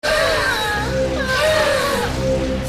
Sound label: Spiral Change Sound